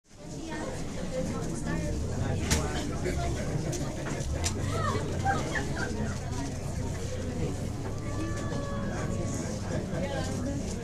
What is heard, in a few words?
restaurant